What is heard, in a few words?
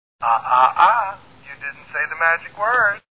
ahahah